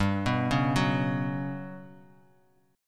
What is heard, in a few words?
G Major 7th Flat 5th, Bass Standard; arpeggiated; JGuitar